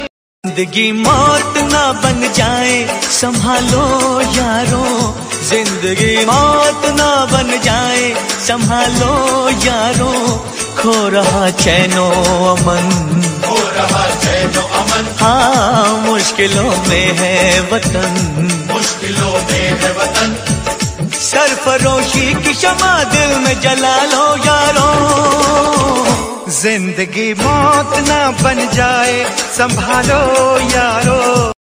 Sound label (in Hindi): Desh Bhakti Song Ringtone